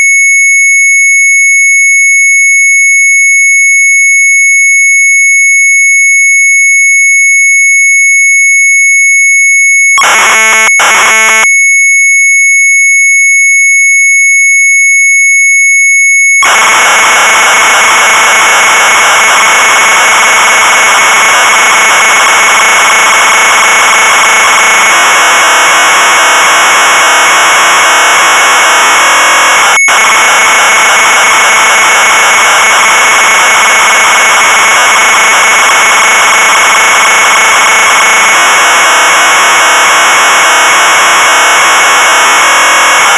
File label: Sharp MZ800 Tape; retromelsarchive